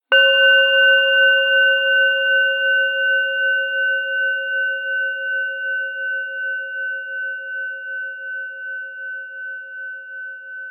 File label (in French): bol chantant tibetain mantra 12 cm